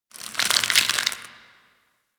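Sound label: Ice Crackle Random Ambience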